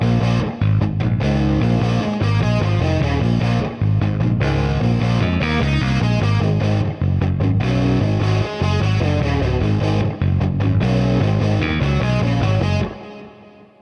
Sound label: guitar bass bridge